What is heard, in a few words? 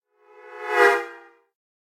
Car Horn Doppler By - Fast And Short Passing Car Horn - L to R; Hollywood Edge; sound designer's toolkit v2